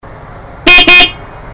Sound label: horn